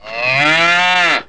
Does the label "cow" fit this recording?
Yes